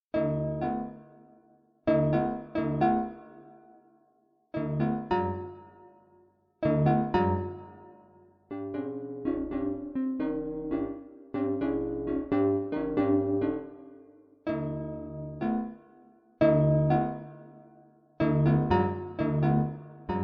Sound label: Arpa